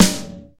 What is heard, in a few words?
wet snare F sharp key, Royality free steel snare drum sample tuned to the F# note. Loudest frequency: 2982Hz